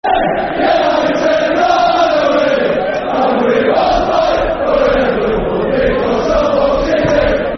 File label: Hark Now Hear, Football Chants (Soccer Songs); Sheffield Wednesday Swfc